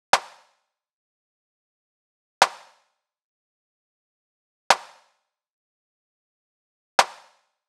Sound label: Clap Loop, 105, Afrobeat; Afro Drums